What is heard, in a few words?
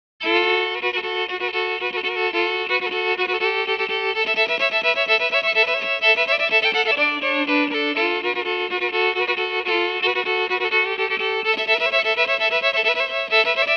Electric violin; Silkyn Tube Amp